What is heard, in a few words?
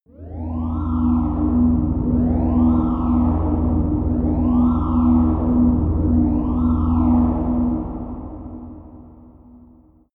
alien ship dark hallway; raumschiff